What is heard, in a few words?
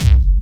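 hard bass drums; Bazzdrumz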